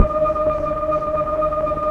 KEY Optigan F; Optigan Keys

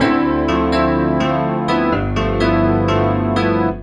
E PIANO 125, Keys; Houseworx

